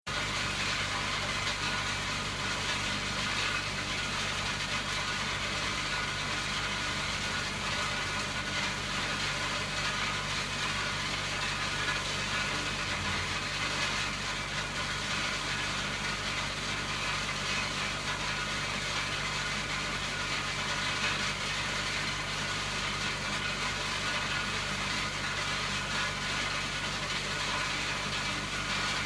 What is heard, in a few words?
Industrial Mechanical Quarry Large Stone Conveyor Constant; Conveyor Belt Sound Effects